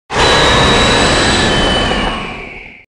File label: roar; Valstrax